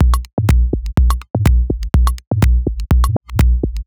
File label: Bigboom C 124bpm, House Drumloops; Zero-G House Fabrik; 90 s Sample CDs